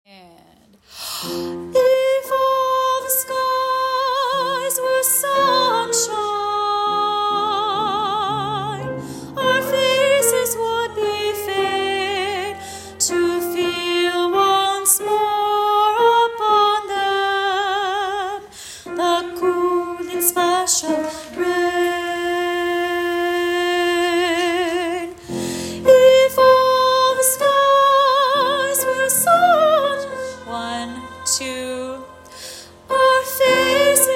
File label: Soprano 2